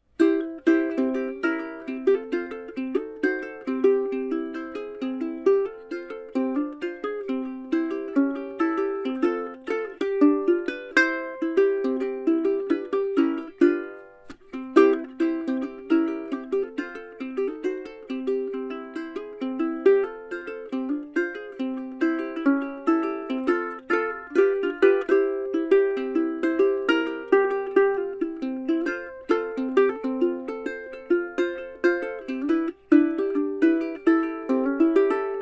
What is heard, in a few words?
National Soprano Deep South Cone, resonator; Delta RC